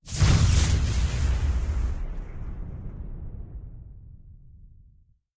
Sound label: Explosion Whoosh Electrik